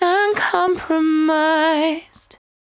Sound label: 74-5bpm B, Velvet Vocal; While U Could; Zero-G